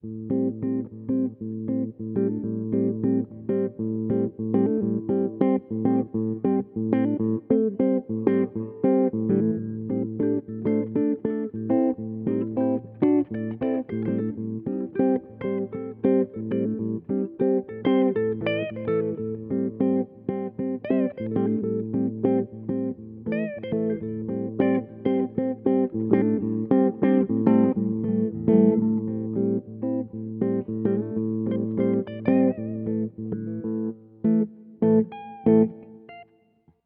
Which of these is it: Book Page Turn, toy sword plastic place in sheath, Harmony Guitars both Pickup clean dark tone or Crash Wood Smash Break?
Harmony Guitars both Pickup clean dark tone